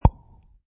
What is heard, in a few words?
Cartoon Pop